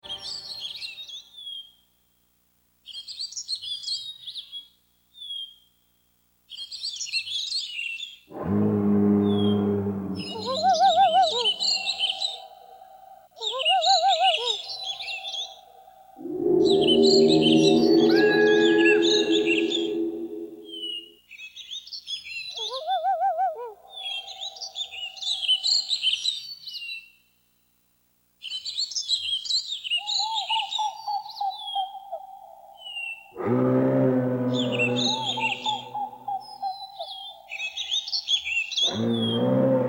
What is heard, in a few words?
Bird Chirps and Dino Calls Ambience; starfox